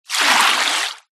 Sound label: nature water movement swish; zapsplat